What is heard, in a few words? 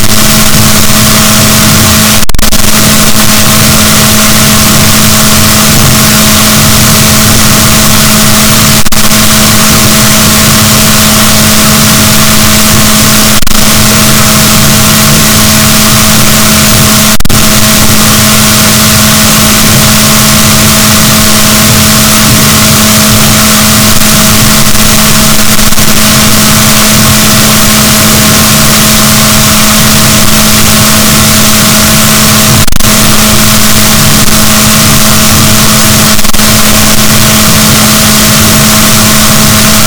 rorquals negatif, gaussian noise, BalaenopteraPhysalus Chile